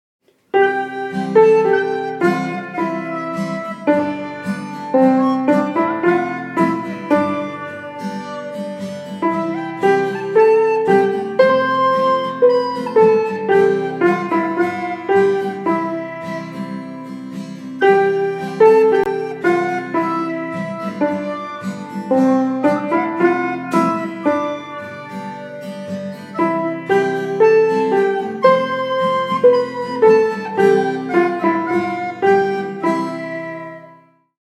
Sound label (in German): Klavier Blockflöte; Alle Jahre wieder